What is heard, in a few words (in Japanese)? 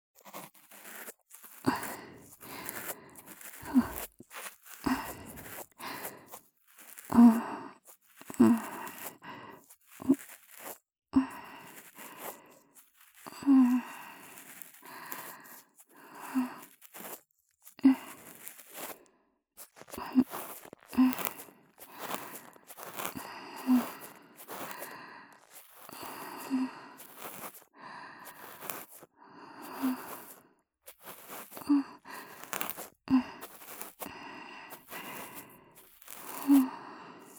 綿棒 左; えろごろ！